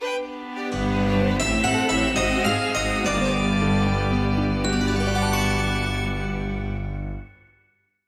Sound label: Game Over